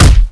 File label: punch smack